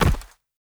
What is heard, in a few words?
body fall down